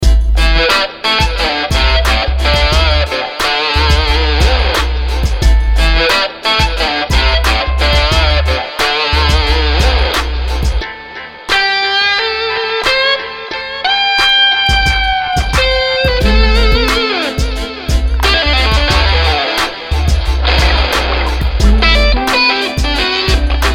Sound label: fuz